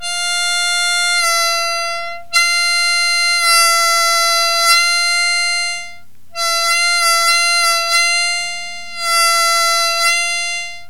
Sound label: bending, harmonica